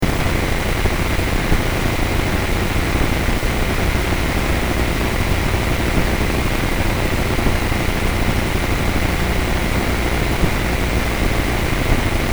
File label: jdxi noise